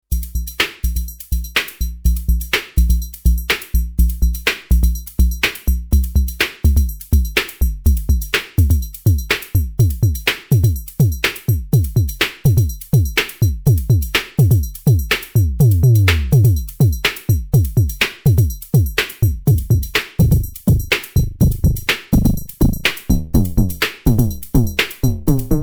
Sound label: Kick